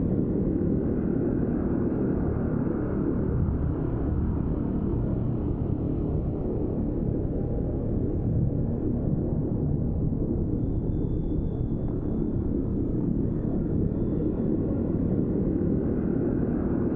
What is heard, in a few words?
ship cruiser idle; Stellaris; machineage; ships